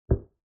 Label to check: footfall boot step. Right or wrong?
Right